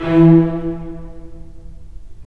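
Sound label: STR, strings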